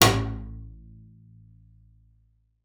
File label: A1, bass pizz; Xsample VOL-5